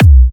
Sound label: Kicks B; markus hakala